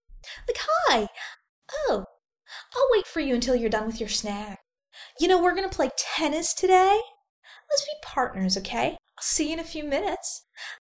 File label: ooh